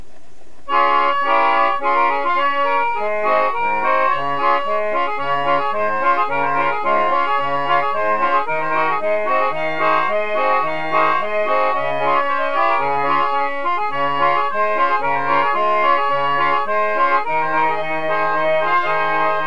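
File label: acoustic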